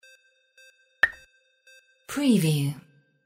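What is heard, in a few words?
water drip single; windy beach audio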